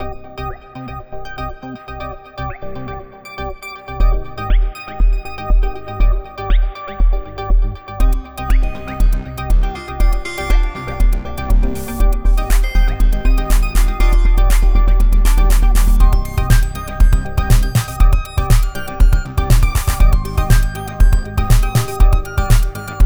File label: edm